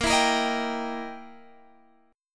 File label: E Minor Major 7th Flat 5th, A sharp